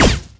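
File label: flyer attack; lmctf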